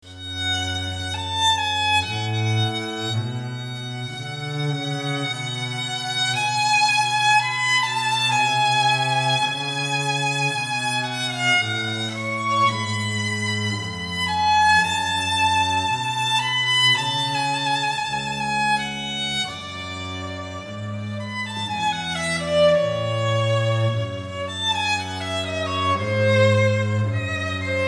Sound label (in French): Duo Violon Contrebasse; louis-antoine lassonde; Albinoni